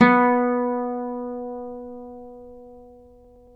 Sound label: Celtic Harp, Plucked Strings